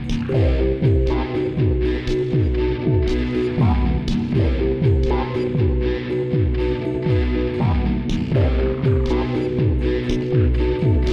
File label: last stand in space looped